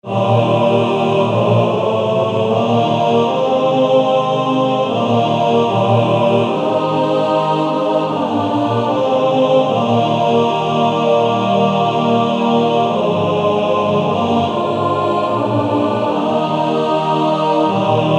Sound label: Male Choir TTBB; Lead Me Gently Home Father